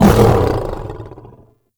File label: Cast Impact Vocal; Warframe; Uriel; Remedium